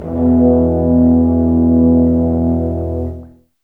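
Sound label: 60 dark, orchestra; East West; Scoring Tools; Dark Side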